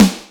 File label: fat snare; Greytsounds VOL-1